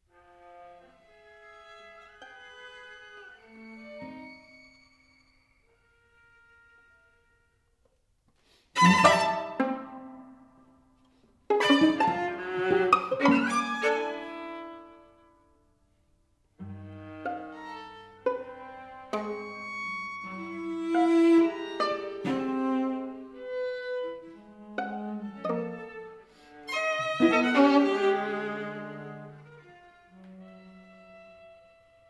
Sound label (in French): Quatuor; Boulez; Livre Pour Quatuor Révisé